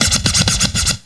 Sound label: scratch